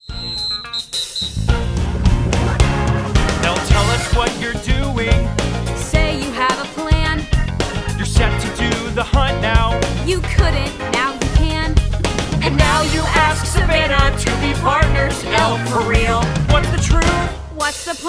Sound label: Vocals